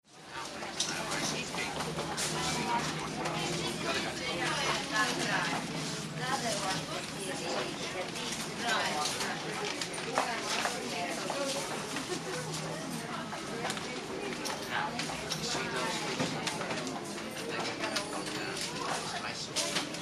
CROWD -STORES AND HALLS STORE: Busy souvenir store, slight Muzak background; 34th street